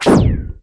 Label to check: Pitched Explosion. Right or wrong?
Right